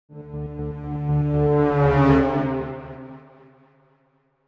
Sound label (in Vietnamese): Âm thanh nguy hiểm căng thẳng